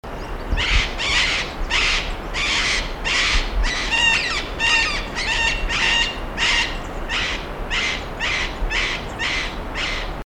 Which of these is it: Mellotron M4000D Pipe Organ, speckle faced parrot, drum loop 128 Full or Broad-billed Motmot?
speckle faced parrot